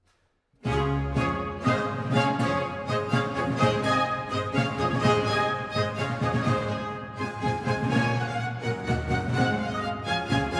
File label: Water Music Alla hornpipe; collegium1704